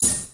hyperspace gem land

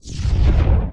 preblast

